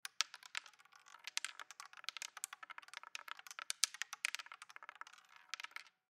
Roulette Wheel, Roulette Wheel Table Small Short Spin Ball Bounce Casino; Casinos; SoundEffects-Blastwave; Sports